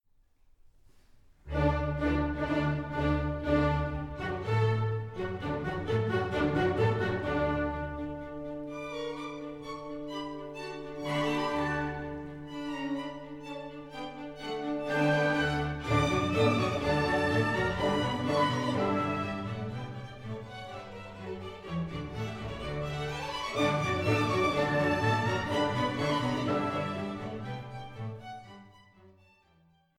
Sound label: Violin Con D